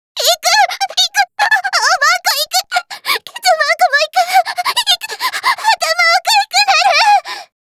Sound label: lap